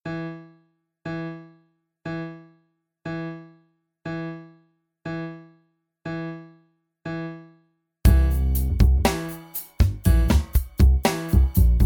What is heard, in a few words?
Adagio